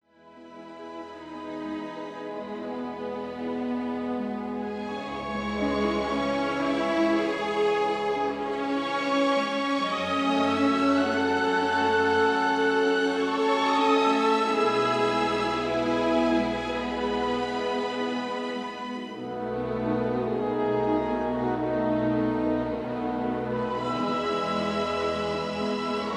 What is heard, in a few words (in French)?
Musique; Pagliacci